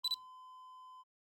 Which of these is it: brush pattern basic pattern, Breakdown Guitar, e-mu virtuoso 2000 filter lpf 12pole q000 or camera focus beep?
camera focus beep